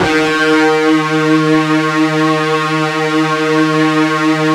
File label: FUZZ D#2, Guitar; East West; Steve Stevens Guitar Samples Collection